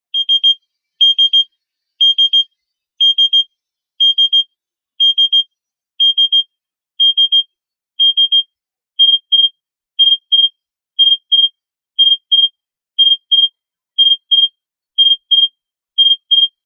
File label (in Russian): Морзе 'О'